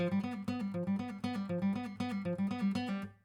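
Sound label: acoustic pattern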